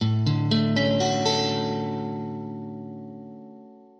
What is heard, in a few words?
D maj7